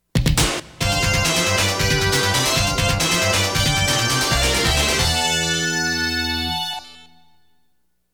Coin Toss BGM; Konami Game Music Collection Volume 0; Cross Counter

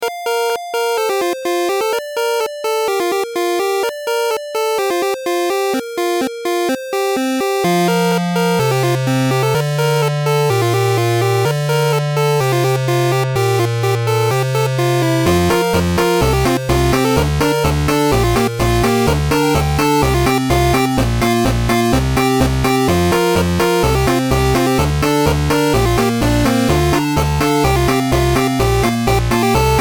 Jolly jingle; Duck Game

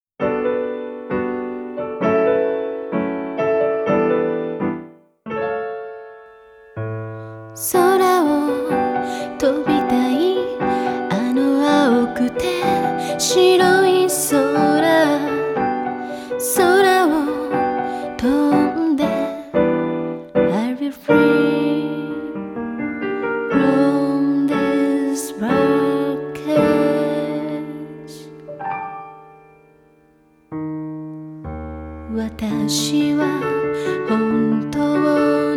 (Piano Ver.); Sekai no Subete Original Soundtrack

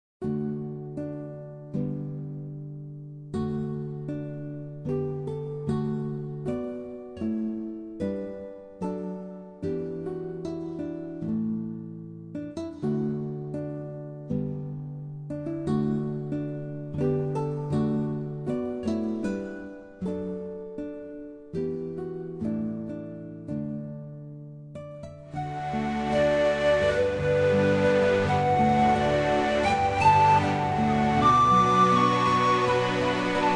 Forest; Planet Explorers

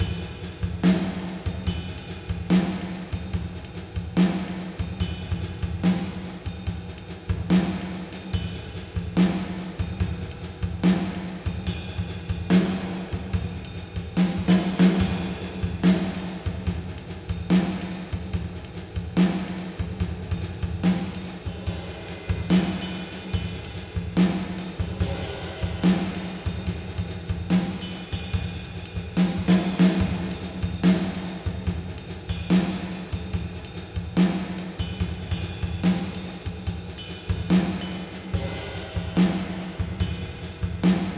Combat Drums Stem; Necropolis

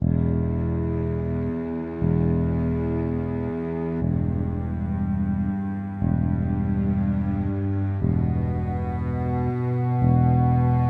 Exploration Main 60bpm; project zomboid; No Signal

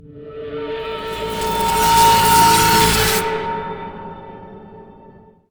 Mus Fireworks Overlay Search

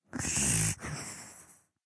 Industrial Sleep; Cut the Rope: Time Travel

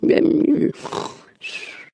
Sleep; Caesar; Cut the Rope: Time Travel

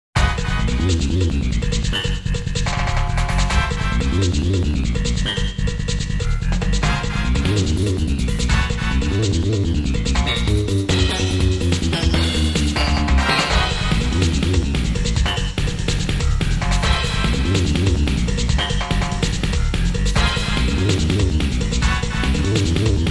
Continue; super muscle bomber

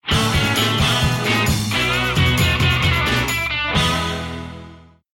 End Event (Victory); The Onion Knights

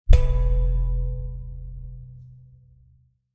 Mountain SFX, Tempo Drum Hit

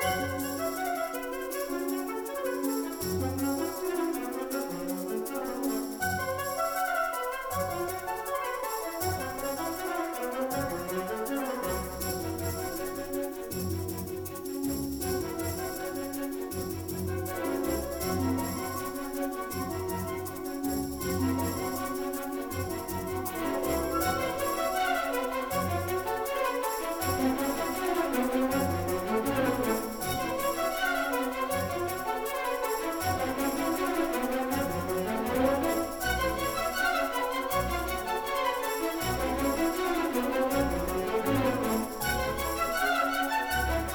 Music Fight Brigands Theme Easy Bpm160 Loop; asterix obelix slap them all 2

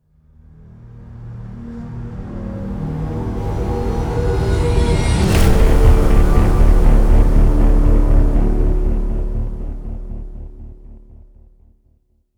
Death Stings; Deathgarden: Bloodharvest

